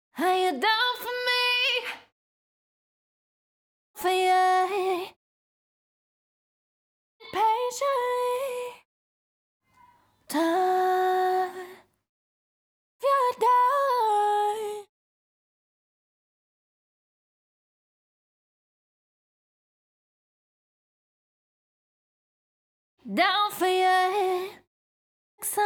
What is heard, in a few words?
CLEAN DUP L (F#m), Acapellas, Pop Vocals; Ghosthack; Ultimate Pop Vocals